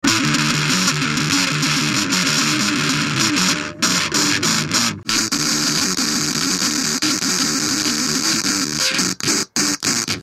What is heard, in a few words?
DOD Grunge